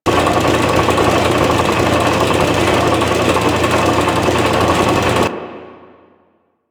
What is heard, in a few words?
Robot Crusher Teeth Loop; Ambience; Props; Warframe; Corpus Gas City